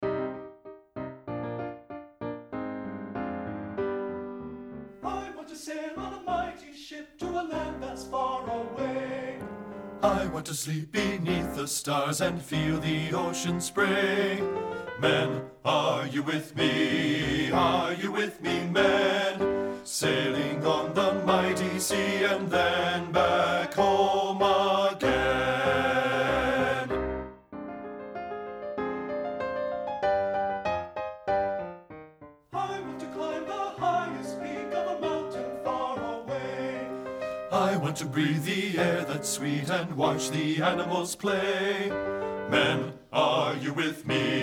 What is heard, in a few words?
MEN BASS; partbypart